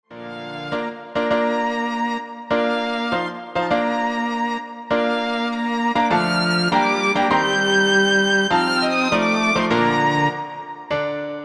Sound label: Piano Trio